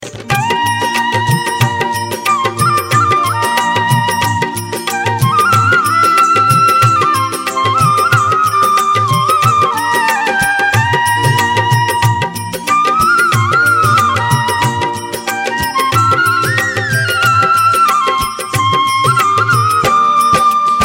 bansuri religious, krishna ringtone

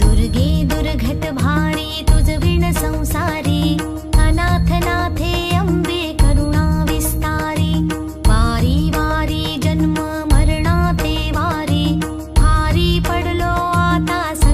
Ma Durga Aarti, Marathi Devotional

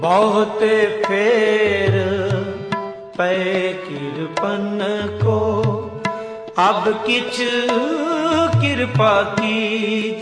Sikh Ringtone

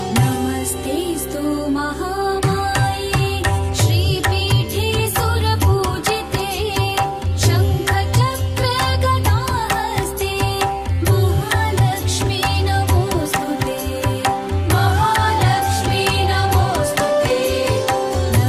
Mahalakshmi Ashtakam, Durga Devotional

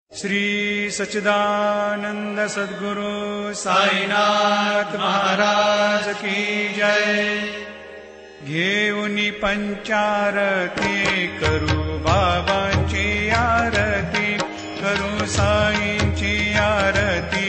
sai panch aarti ringtone; saibaba

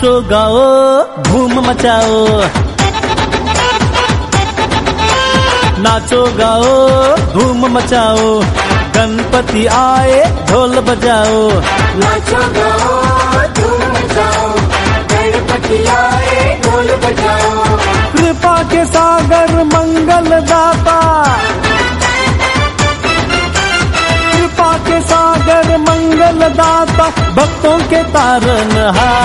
Dhol

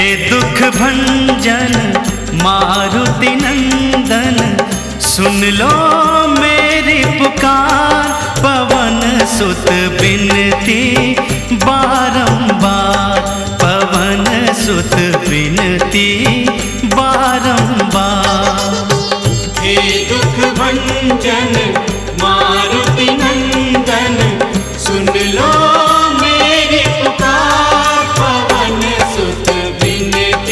Dukh Bhajan, hanuman ringtones

